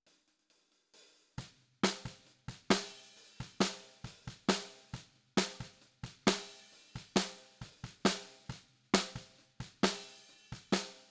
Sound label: Snare Bot